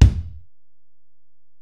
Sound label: Ac BD, Alesis DM-PRO; 300 Drum Machines